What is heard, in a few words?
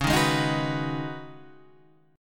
C sharp 7th Suspended 2nd Suspended 4th C sharp down; C#7sus2sus4; strummed; JGuitar